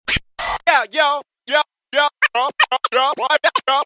scratching

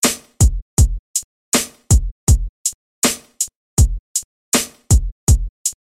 boompap

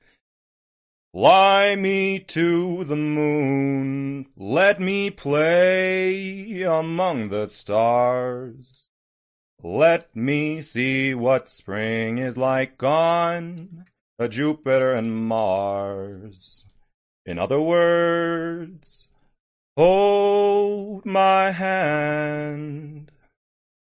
singing; smallant

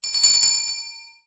clock

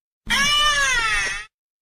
message tone; roosterteeth; gavin free

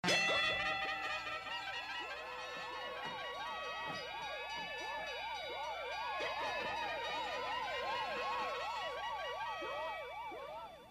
five second win alarm; tec seasons 5-6

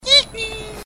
squeak; tonio

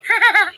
cute laugh short

